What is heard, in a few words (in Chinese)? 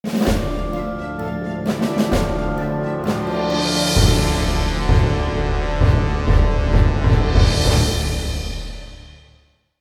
大气的背景音乐